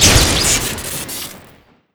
flame rocket shoot crit; tf2

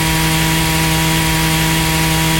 circle saw fire; tf2

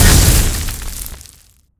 acid ball burst; tf2

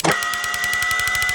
chaingun wind up; tf2

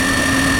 gatling spin; tf2

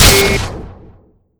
radio gun shoot; tf2

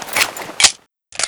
revolver world reload; tf2; scout

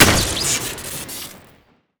bow shoot soft crit; tf2

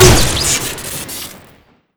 baseball gun shoot; weapons; tf2; crit

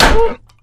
axe hit world; weapons; tf2

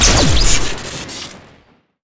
gauss pistol shoot crit; tf2

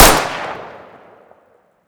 sten gun shoot; tf2

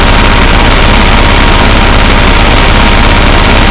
heli gun shoot; tf2

